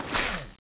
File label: janus3 draw; cstrike